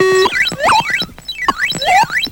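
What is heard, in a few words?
FX left, attacks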